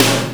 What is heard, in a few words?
snare fx; Breaks N Beats Sound Kit; DnB Klub Kit